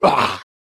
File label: Male zombie attack